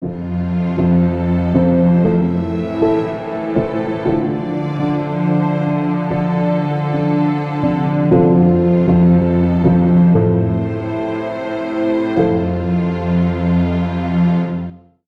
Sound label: Relaxing Piano Strings